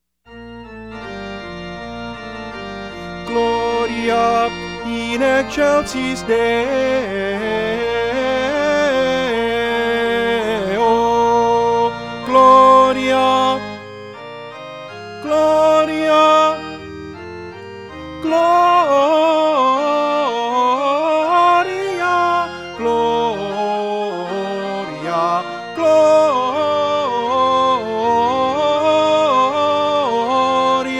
Fanfare Xmas; Shaw

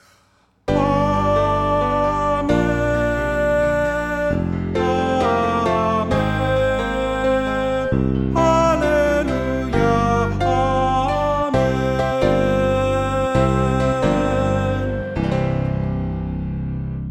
Descant

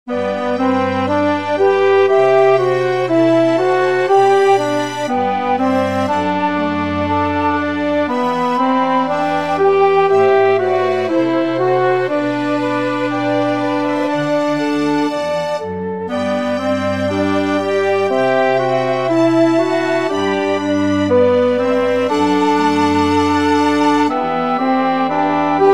TUTTI

